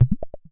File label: interface button, clicks